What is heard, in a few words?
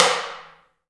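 T DRM, talking drum, Heart of Africa; Spectrasonics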